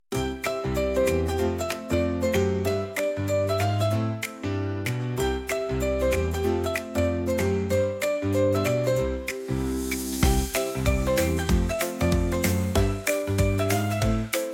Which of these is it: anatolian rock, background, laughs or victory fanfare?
background